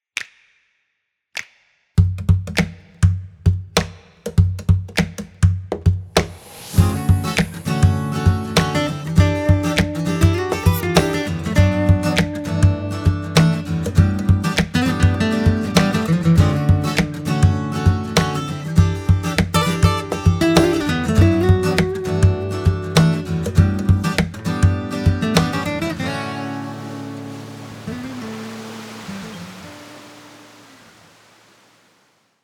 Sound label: groove